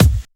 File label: Kick, Drum Hits; Trance; Zero-G Trance Inducer; 90 s Sample CDs